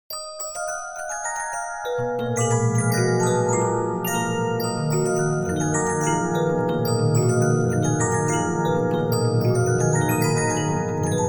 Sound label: Regina, 15inch disc musicbox